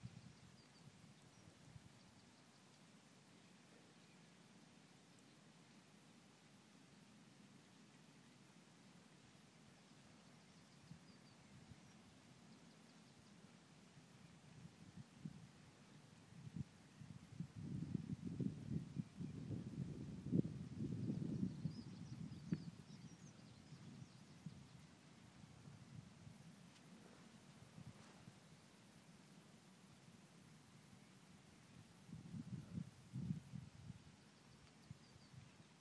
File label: 60 seconds of billings morning ambience